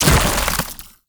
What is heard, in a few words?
Infested Move Turn; Warframe